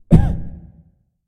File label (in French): Coprs Tousse